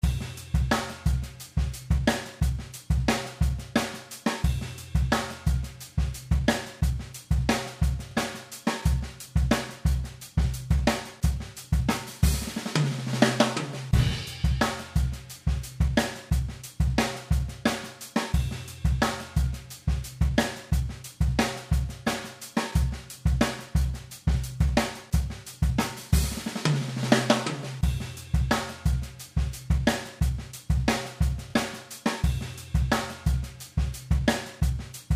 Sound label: bass odd time slow